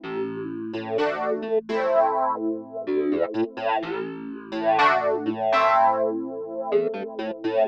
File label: synth house 125 A; eJay; Keys